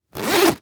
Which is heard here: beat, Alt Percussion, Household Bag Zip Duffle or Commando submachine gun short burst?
Household Bag Zip Duffle